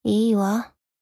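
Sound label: attack; hina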